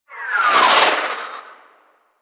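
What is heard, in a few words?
Super Weapon Missile Projectile By; Warframe; Vehicles; RailJack; Proc Ships; Corpus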